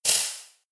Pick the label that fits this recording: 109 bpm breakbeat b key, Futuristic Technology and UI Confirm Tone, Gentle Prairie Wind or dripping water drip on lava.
dripping water drip on lava